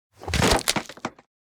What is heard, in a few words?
board crack; anemoi